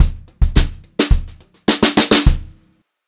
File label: HOTROD FILL, Brushed Drum Loops; USB Soundscan vol.39; AKAI